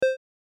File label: ui click retro; ghost-delivery